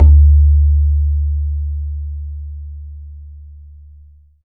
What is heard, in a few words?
BAYAN, Drums Percussion